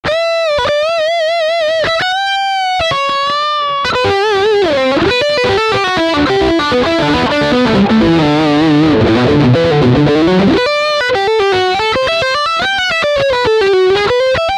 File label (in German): Leadline Steg Humbucker